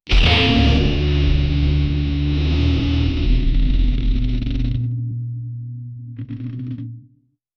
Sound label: deep glitchy aggressive lo, glass break